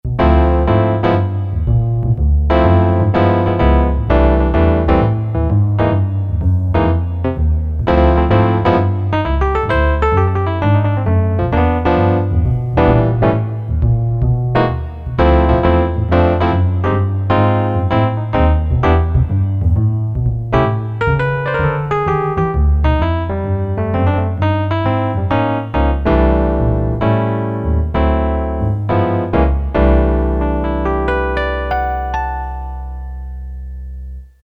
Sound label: E.P. BASS; Korg DSS-1